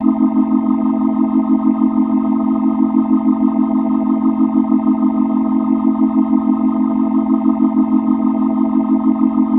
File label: Organ A Dim, Organ Licks; Dance